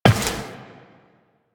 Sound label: Step Glass, Footsteps Walk; Warframe; Tenno